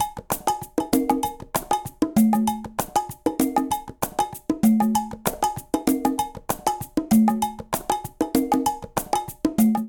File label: Pop 97bpm, Latin Percussion; Ableton; Factory Packs